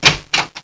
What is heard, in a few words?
unlock